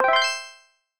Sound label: Flying Checkpoint; Robot 64